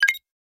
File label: pda tip; New 3D PDA Interface